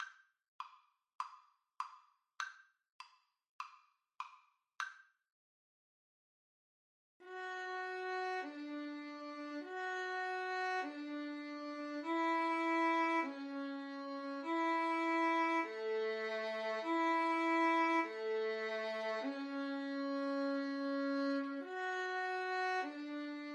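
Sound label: violins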